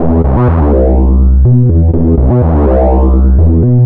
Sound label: Synth Bass